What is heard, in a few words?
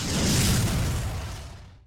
rocket; weapons; starruler